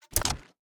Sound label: submenu dig open